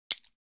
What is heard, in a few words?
draw, knife; weapons; Counter Strike Model Pack; CS2; knife gypsy